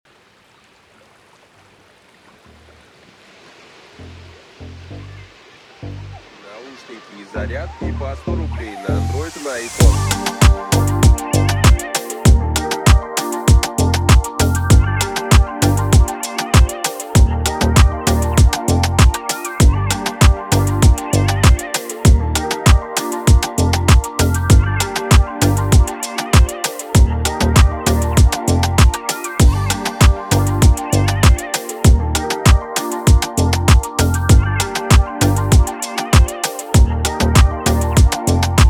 BEACH VIBES Instrumental

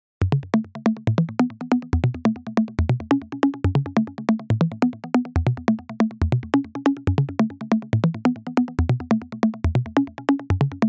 Trance

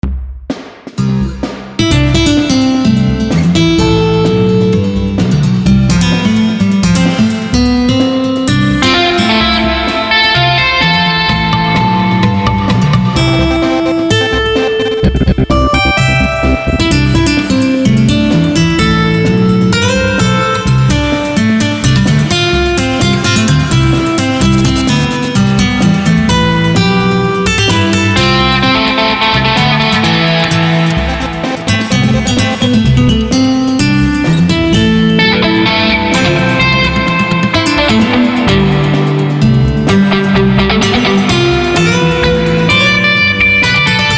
GITAR Gitar

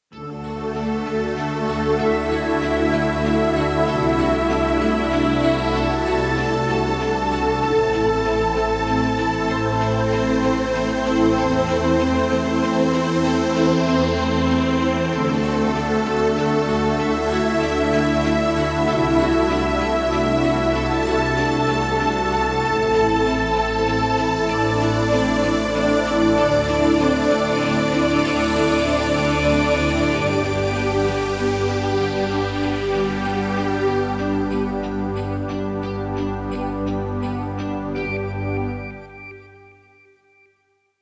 Light touch Background Music

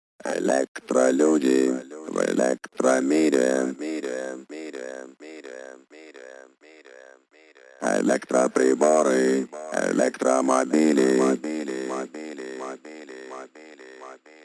Acapella

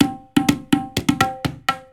PERC LOOP 125 BPM

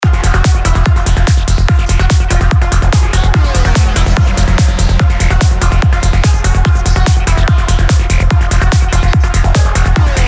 Psychedelic trance

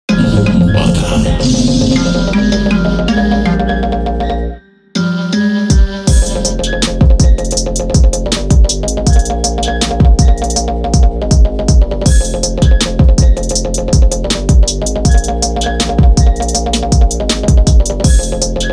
instrumental

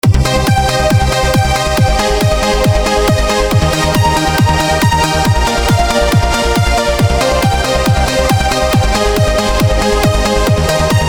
DANCE TRANCE

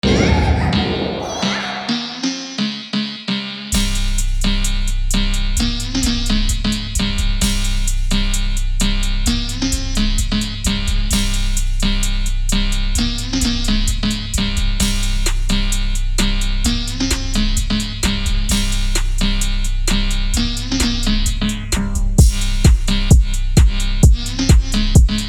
Phonk Фонк

